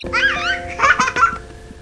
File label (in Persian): صدای خنده بچه; برای زنگ موبایل; cute